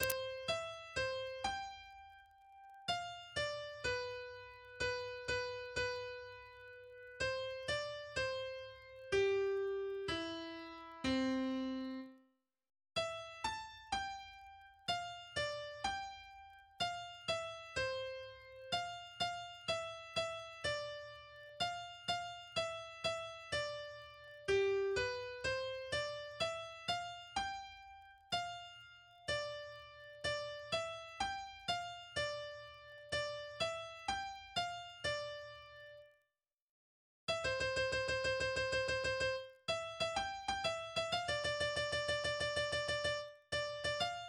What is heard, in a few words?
Argentina national anthem for harmoncia in piano